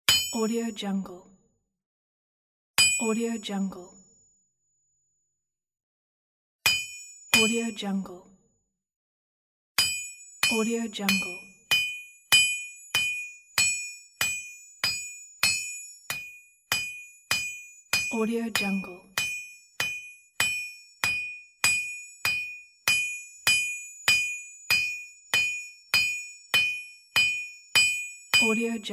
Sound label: hammer blows; ambienceworld